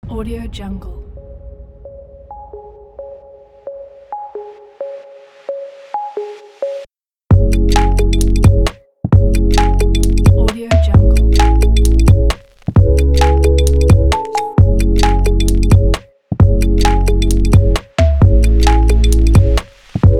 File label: fashion abstract